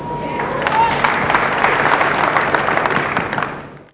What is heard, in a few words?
CROWD